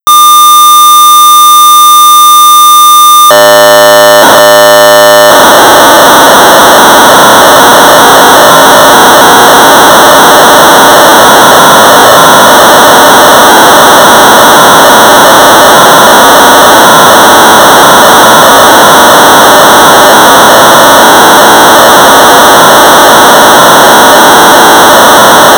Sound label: Sorcerer 1200baud; Eastern Australian Truck Driving; BASIC